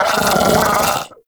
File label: Arachnoid Vocal Attack; Warframe; Corpus; Arachnoid Hunger